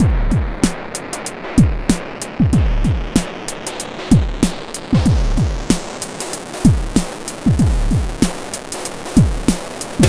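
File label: drum, Breakbeat; DOOM BOOM 095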